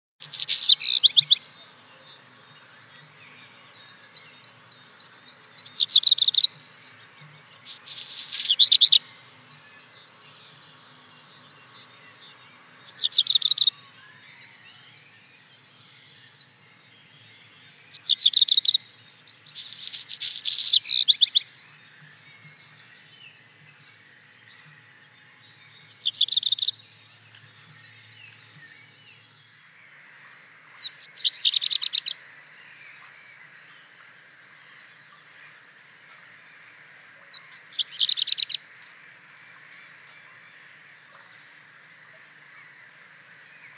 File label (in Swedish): svart rodstjart; fagelljud